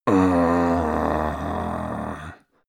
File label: male grumble bored; menschen; koerpergeraeusche; soundpool